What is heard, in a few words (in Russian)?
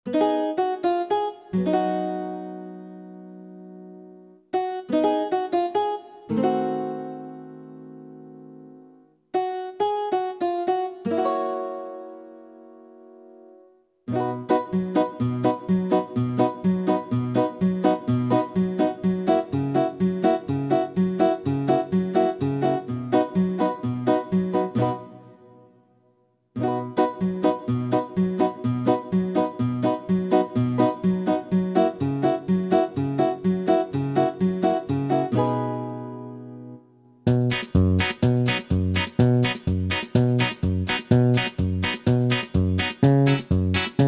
Мелодия; MUZYKALNYEIGRY